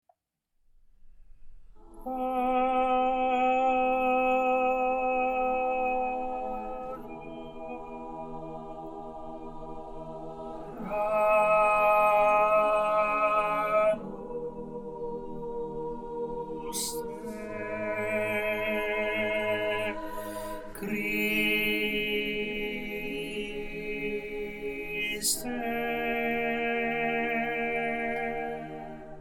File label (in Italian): Tenori